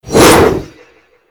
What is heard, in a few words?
Arch Wing Sword Whoosh; Warframe